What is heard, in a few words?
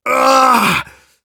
Engineer pain sharp, ru